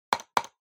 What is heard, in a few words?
PC Mouse L Hard Double